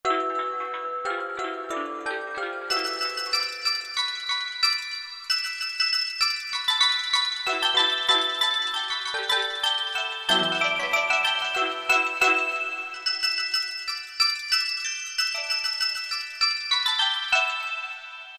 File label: China, Korg M1, Combinations; Factory preset examples